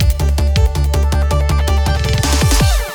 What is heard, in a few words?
Snow Transition; sky rogue